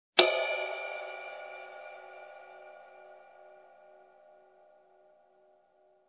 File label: Drum ride edge hit